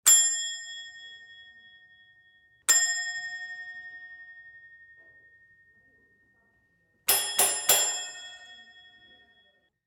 Desk Bell - Brass dome reception bell - various hits; Office